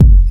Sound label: subby rap kick single shot g key